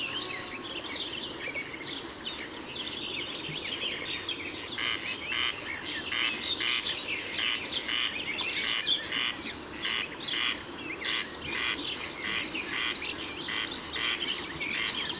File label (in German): Wachtelkoenig; Murnau; Michle Peron